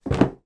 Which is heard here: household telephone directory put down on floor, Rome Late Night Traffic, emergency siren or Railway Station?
household telephone directory put down on floor